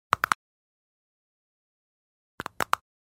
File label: fights